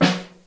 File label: SN1 Room, Snare